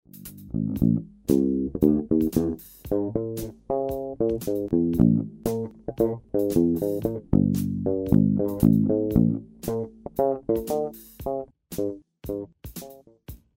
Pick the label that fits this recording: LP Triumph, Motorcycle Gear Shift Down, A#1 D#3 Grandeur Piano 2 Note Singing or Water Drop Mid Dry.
LP Triumph